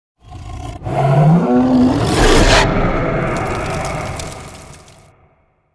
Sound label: die; Phouch; Seer; GamigoNA